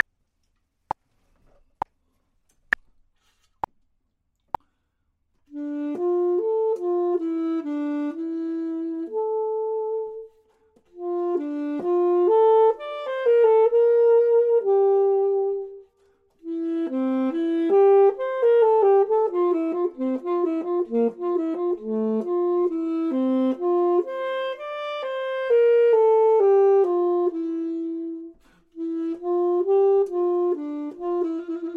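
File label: top, Saxophone and Clarinet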